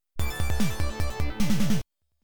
Mystery Prize Collected, pinball

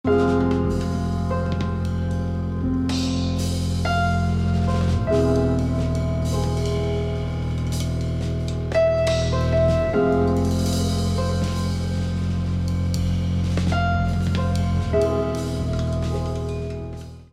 Digging music; Flipping Death; PS4, Switch, Windows, Xbox One

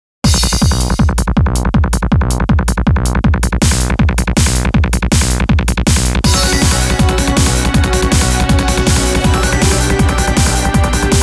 Tension; masoukishin complete sound track 2014

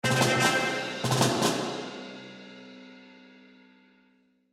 Battle Win; willy nilly knight macos windows gamerip 2017

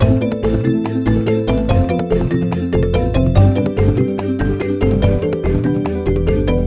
Bonus Round; Tumblebugs

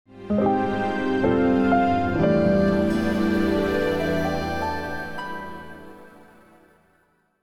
gameover; Tales of Luminaria

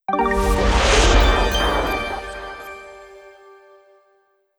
Jingle - Deduction Denouement Title; master detective archives rain code original soundtrack 2023

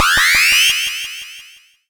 powerup; signal to noise

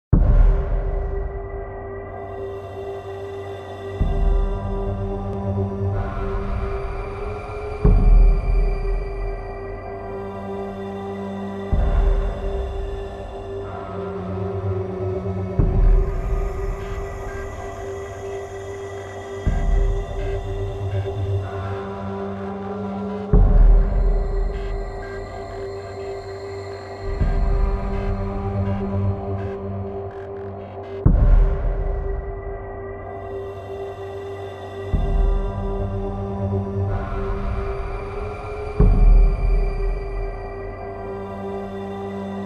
Checkpoint; metal slug awakening code j soundtrack; android

